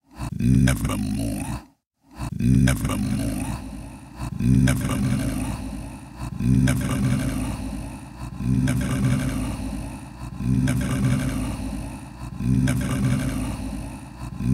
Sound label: FX Delay; ChatDSP